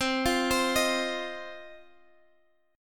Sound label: C Minor Double Flat 5th, Guitar, Half Step Down